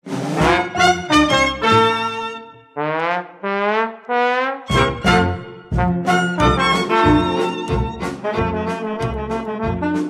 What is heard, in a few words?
Trombone